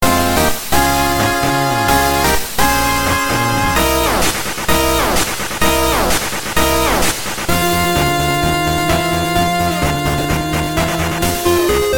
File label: intro 8 bits; gavan